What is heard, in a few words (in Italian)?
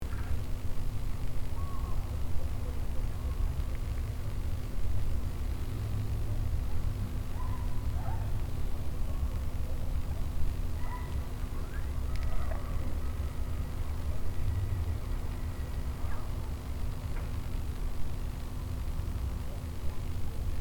Effetto Corona